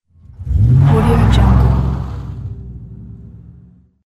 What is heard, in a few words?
a powerful start and driving away car